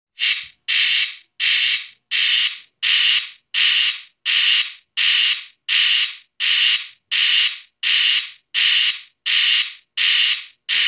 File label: white noise, backup alarms